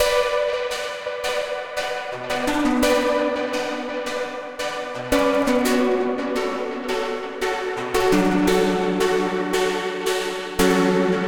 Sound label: Lonesome Plucks, Bmin 85 bpm; MIDI Clips; Tonal; Motifs; Ableton; Factory Packs; Mood Reel